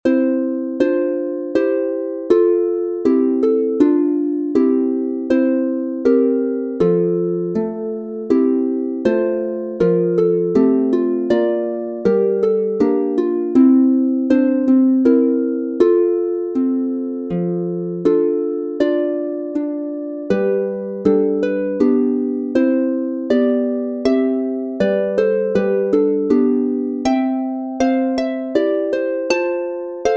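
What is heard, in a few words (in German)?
Menuett F Dur 3 stimmig, Harfe; Kleine Stuecke grosser Meister; Joh. Seb. Bach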